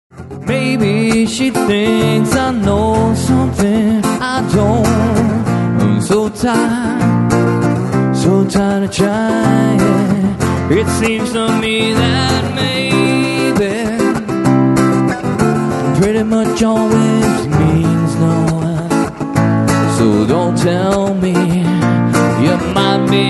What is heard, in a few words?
Flake, Acoustic Guitar